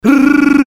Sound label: rrrrrr